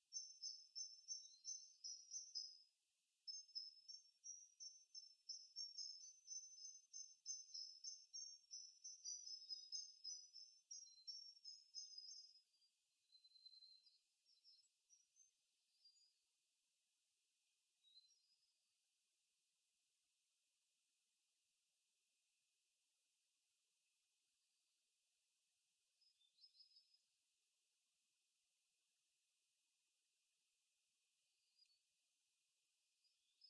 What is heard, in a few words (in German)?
Lichtung Soundaufnahme Botanischer Garten Marburg